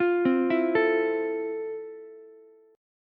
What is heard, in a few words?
F Major 7th F, Ukulele; arpeggiated; JGuitar